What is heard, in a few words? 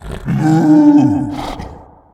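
minotaur scream; minotauro grito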